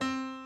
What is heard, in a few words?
middle C piano